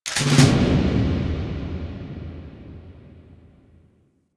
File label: Merchant UI Mission Start; Kahl; Warframe; Veilbreaker